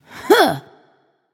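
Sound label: grunt attack, female; smite; voicepack; hera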